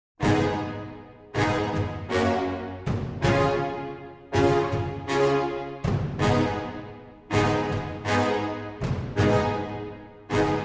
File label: Instrumental